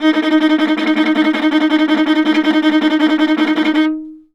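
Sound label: VIOLA tremolo D#3; Orchestral; DirectWave; Varazdin Orchestral; Viola II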